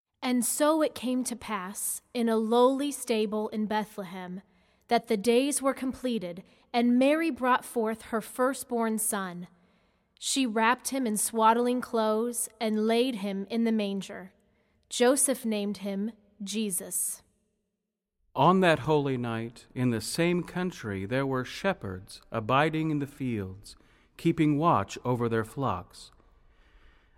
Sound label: satb